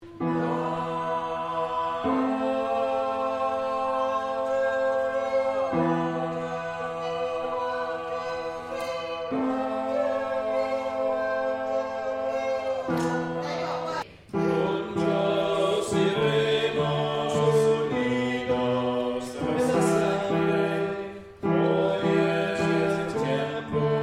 Bass Tenor

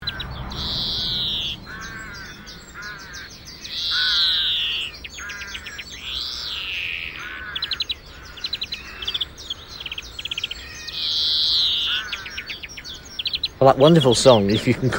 greenfinch, birdsong

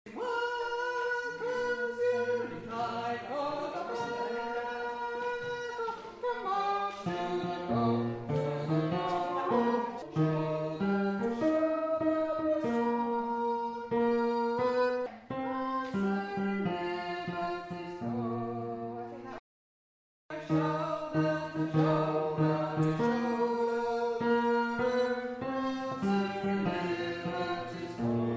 Bass

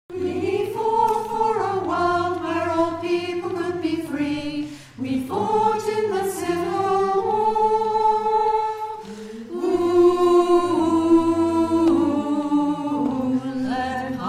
song Alto

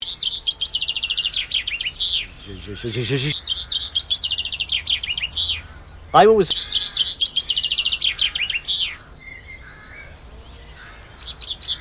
chaffinch

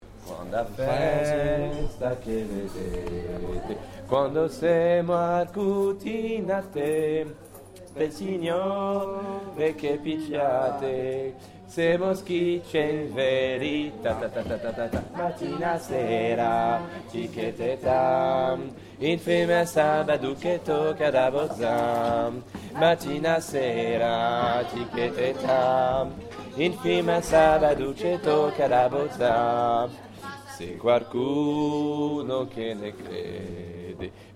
Bass Only

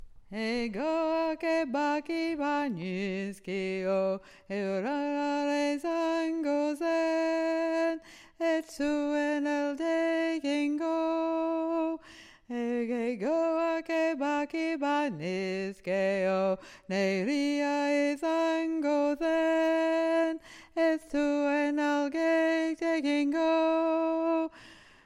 bass

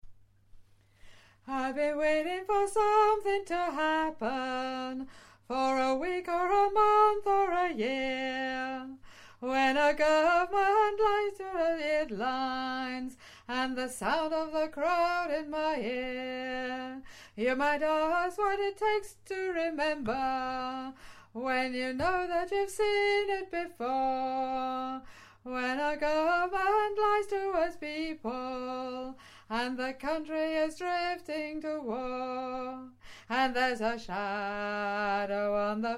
solo bass

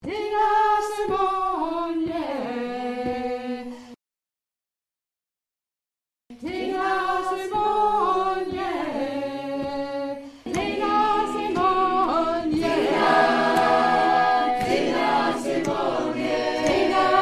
call

